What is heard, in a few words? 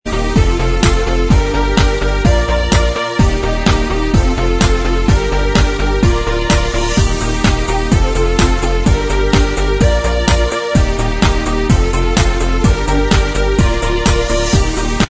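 Inst Track, instrumental track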